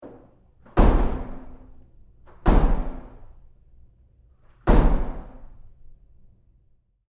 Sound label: Door Bathroom Stall Impact Roomy Bounce